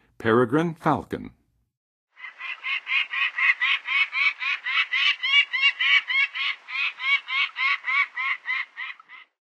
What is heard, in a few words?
Peregrine Falcon, Western USA Songbirds; North America